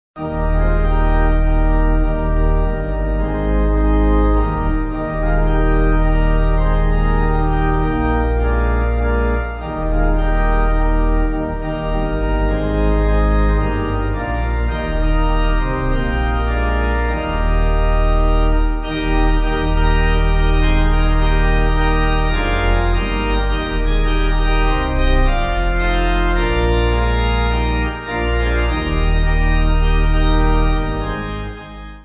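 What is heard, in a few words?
Pipe Organ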